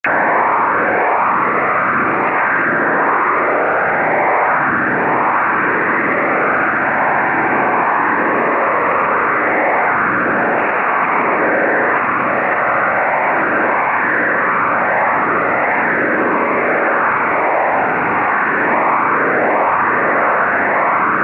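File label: Static Grinding Phase, static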